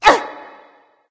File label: grunt hit; smite; voicepack; scylla